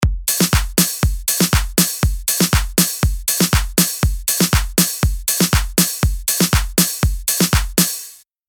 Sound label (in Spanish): beat no reg volumen